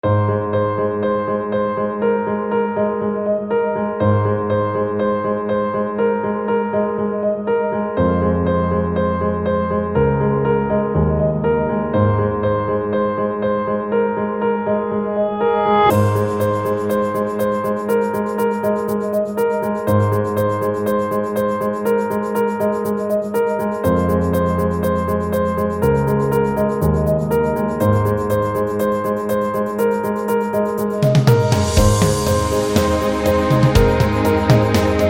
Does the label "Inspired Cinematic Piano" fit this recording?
Yes